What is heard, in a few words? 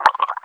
gurp; terror